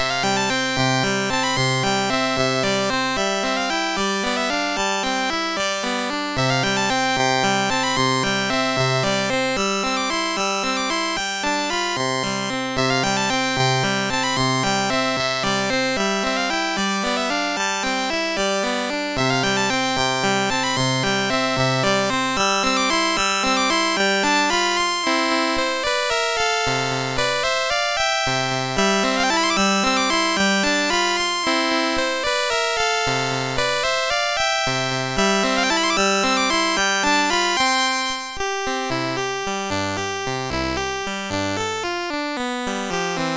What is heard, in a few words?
Waltz, MOS6581R3; Joseph A Grau